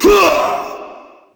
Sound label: kick